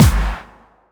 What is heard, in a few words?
snare